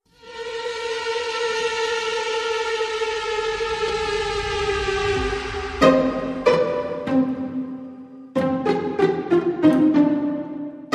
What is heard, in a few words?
Ditzy Pizzy No Perc